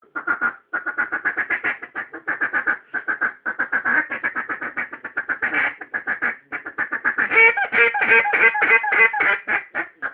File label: duck feed call; animals